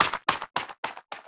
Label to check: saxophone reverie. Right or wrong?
Wrong